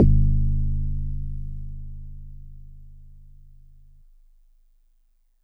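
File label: Marimba DX100 G#1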